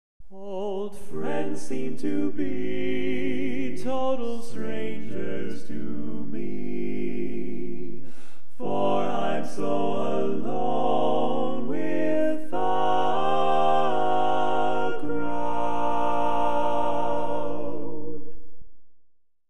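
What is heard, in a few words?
Baritone, barbershop tags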